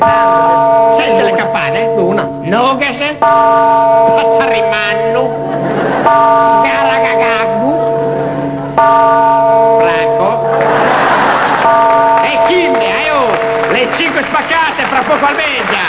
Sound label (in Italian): campane